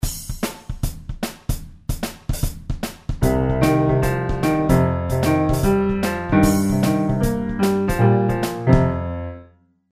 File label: pentatonic, blues; Pentatonic C Minor; playing something on the Pentatonic C Minor now...very short...